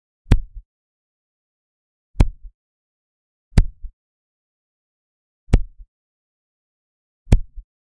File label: short, football